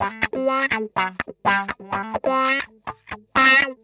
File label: 125 wah A, wahwah; PowerFX - Nu Jazz House